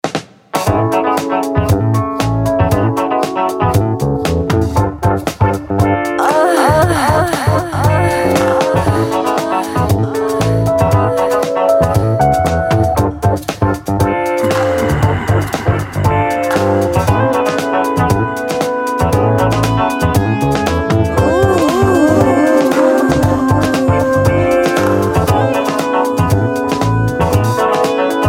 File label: Advert Track